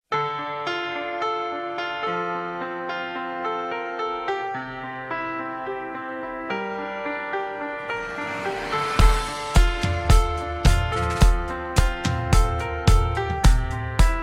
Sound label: instrumental